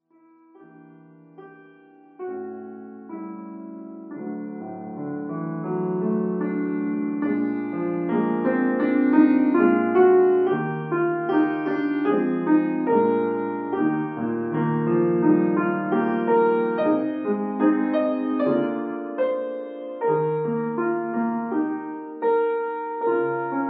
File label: Piano; Larice Music